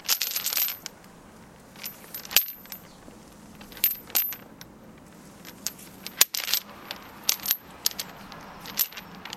Kette

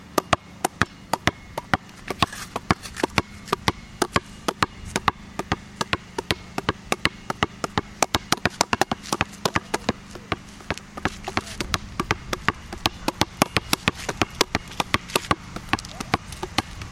basketball dribbeln

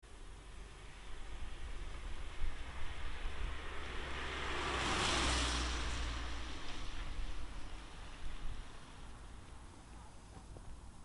Vorbeifahrendes Auto

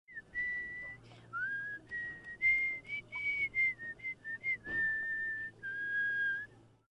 jemand pfeift

